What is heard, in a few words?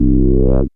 FILTR BASS